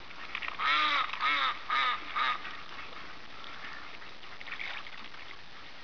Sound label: duckz, ambient; quake; fortress